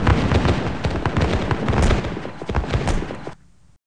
Musket; Waterloo

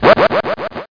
Set Bomb; Escape!

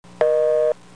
BUSY; tetris

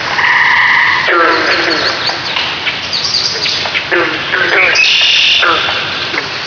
marsh day; PC Gamer IT CD 41; Daikatana

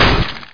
ice wall; Hexen II; crusader

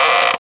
Creak; Princess and the Pea; SoftKey

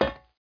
run metal dry; MOTS

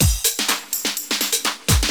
PERCLOOP HOUSE 125; eJay

